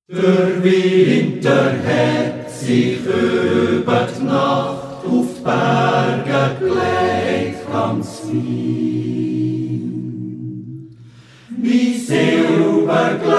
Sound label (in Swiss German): E schöne Wintertag (Chor)